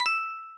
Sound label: Coin